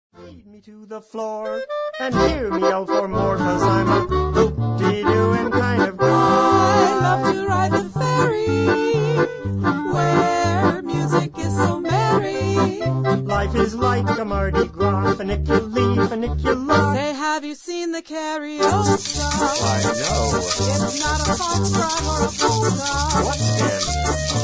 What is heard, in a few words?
Polka Medley